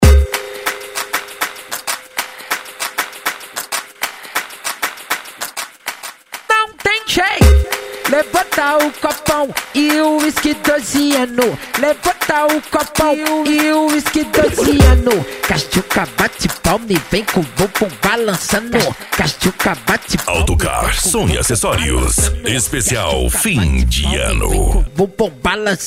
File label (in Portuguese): funk agressivo ritmado e funkadao